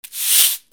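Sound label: percussion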